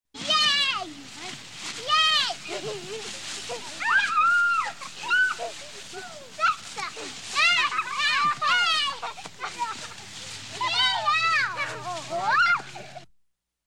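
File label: children about 5 years old playing in the grass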